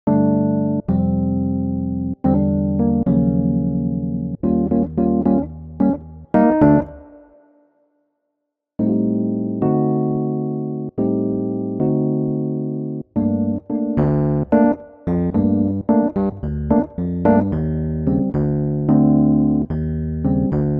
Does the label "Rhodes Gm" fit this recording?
Yes